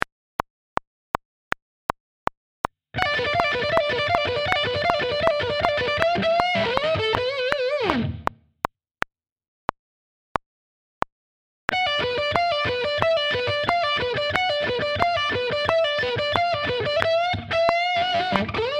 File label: Sweeping Ex